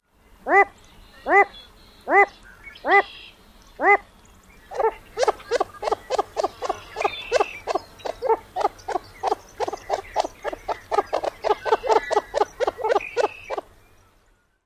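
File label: nasal notes and other calls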